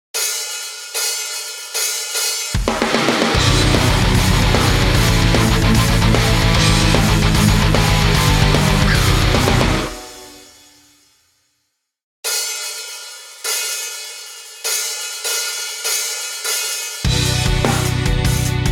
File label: karaoke